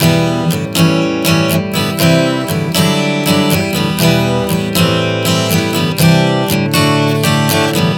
Em-A7-C-D 120 bpm, acoustic guitar chord progressions